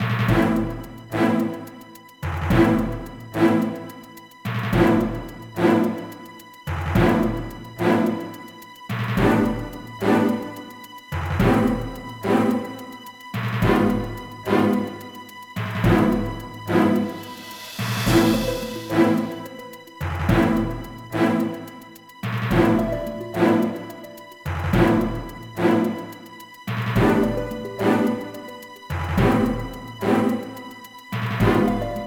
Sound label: Tension; nostalgia wind of nostalgio; nostalgio no kaze